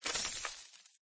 coin

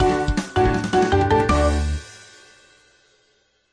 win

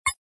rotate

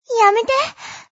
fish die

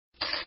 on bet

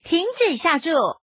stop

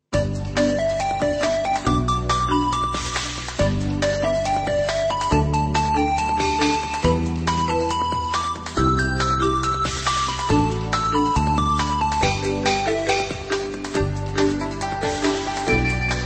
Music Exciting

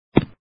deal card

